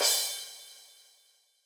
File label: Crash Cymbal; Yamaha DJX-2B